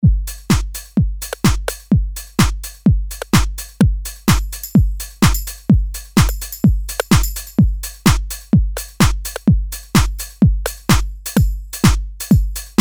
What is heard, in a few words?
DRUM KITS Dance Kit; Apple GarageBand 2